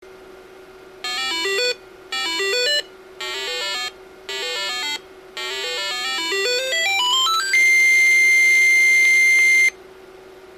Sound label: music